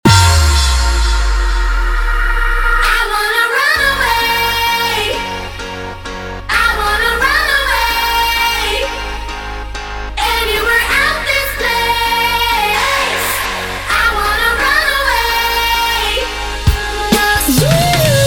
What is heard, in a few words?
intro outro 130 bpm